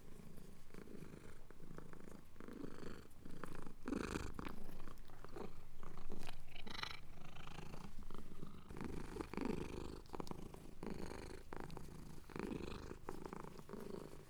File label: Cat Purring Licking